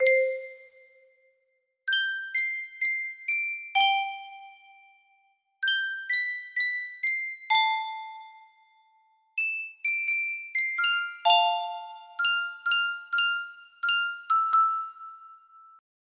Sankyo custom tune music box

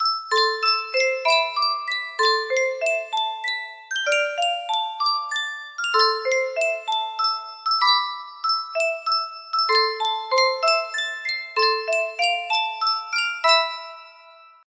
carillon

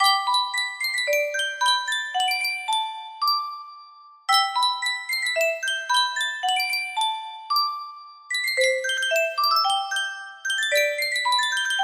Sankyo music box, music box melody

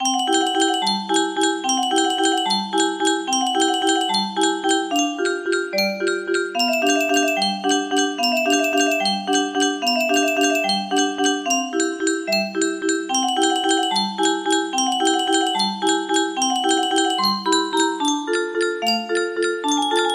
Carousel Music Box, music box melody

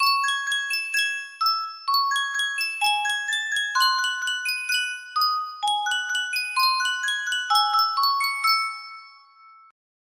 sankyo miniature music box

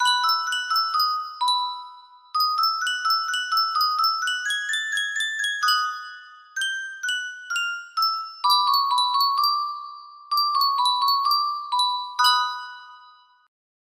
reuge music box, music box melody

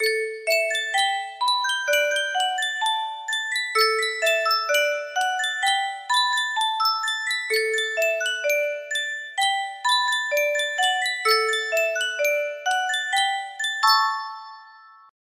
music box

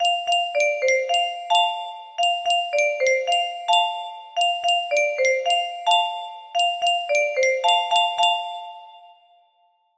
melody, music box

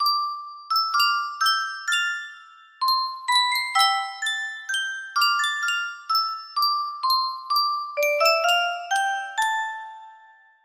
Yunsheng music box, melody; final fantasy ix

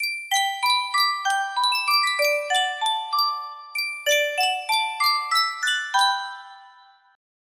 Sankyo miniature music box

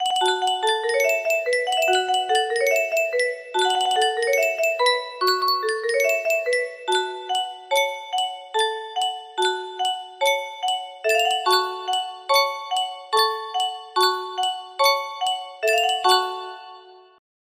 Yunsheng Music Box, music box melody

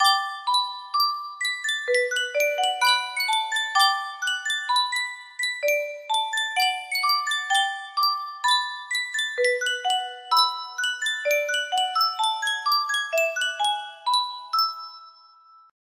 Yunsheng music box, music box melody

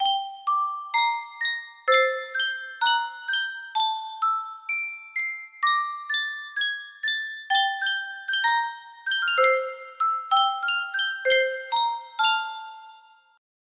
sankyo music box, melody

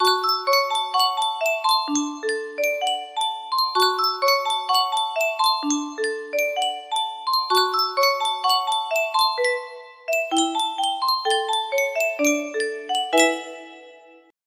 Yunsheng music box

